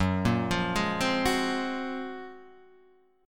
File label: F sharp Major Sharp 11th, Guitar, Drop D; chordshape; down